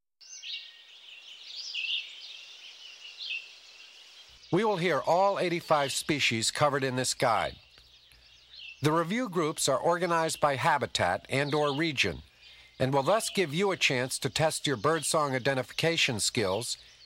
Forest Edges, Birds; Birding By Ear

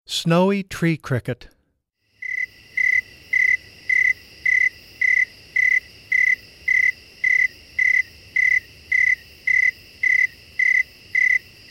Snowy Tree Cricket, Wildlife Sounds

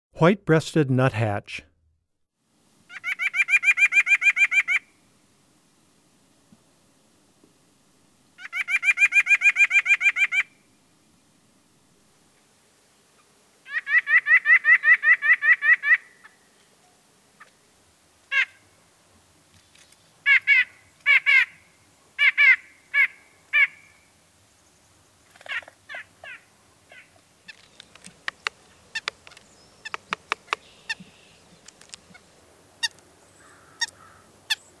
White-breasted Nuthatch, Wildlife Sounds

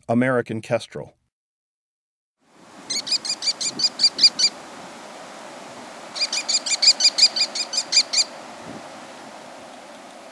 American Kestrel; Breeding Birds of the Chicago Region

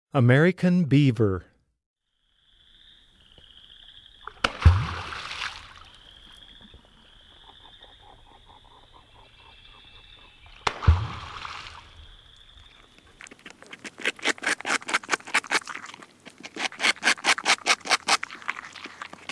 Beaver, Animals Wildlife Sounds

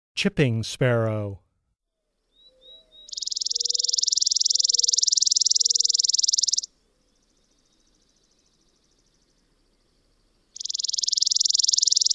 Chipping Sparrow

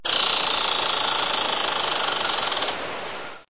Tamias striatus, Mammalia; Chordata

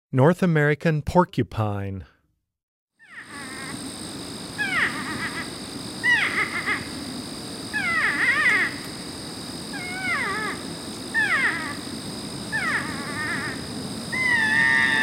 Porcupine, Animals Wildlife Sounds

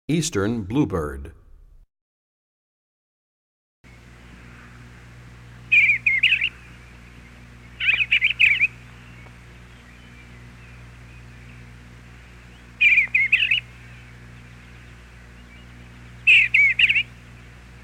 Eastern Bluebird; Animals; Birds; 101BirdSongs

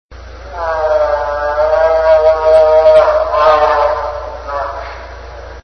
Alces alces female; Chordata

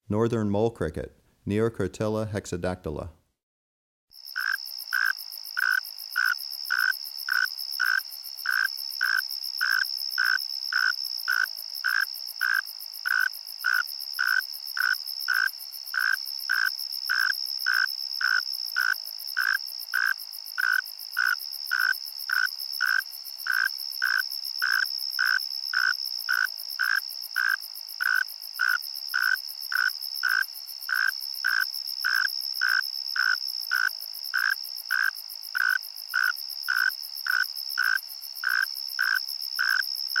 Northern Mole Cricket, Neocurtilla hexadactyla; Crickets and Katydids

